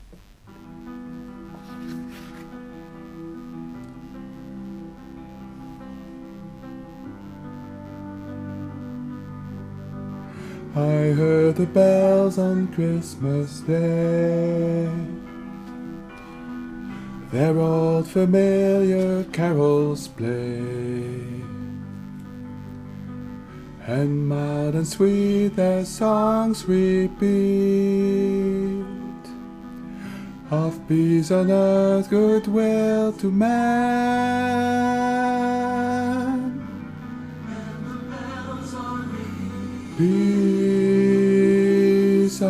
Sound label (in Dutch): Sopraan Hoog; I heard the bells on Christmas day